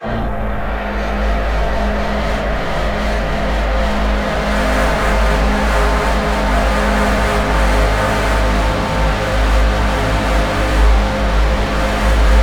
texture increase pressure

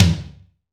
TOM, DRUM KITS; InVision Interactive; 40 Oz Phat Slammin Hip Hop

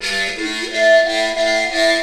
ethno, ethnic; Techno Trance Essentials

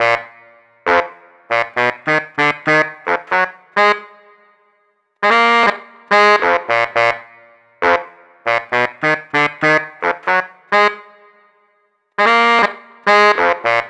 Saxophone, Cinematic Funk Urban; Sonic Foundry (Sony Creative Software); Cinematic Funk Urban Tone Poems

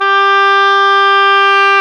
oboe, Oboe Brth

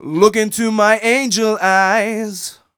m 127 mel a, Vocals

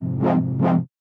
Fm, Chords; M8; Electronisounds

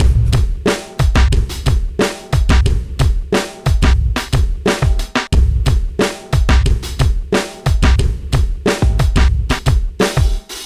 punch up 090bpm; Zero-G; Downbeat Dance Grooves

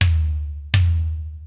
Rock N Roll, Drum Machines

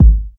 BD, BD Dance; Sound & Vision; Gigapack I CD 1

